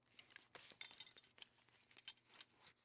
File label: rapell